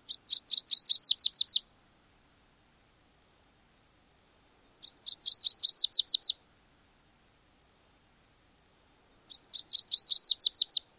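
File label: Wilson's warbler songs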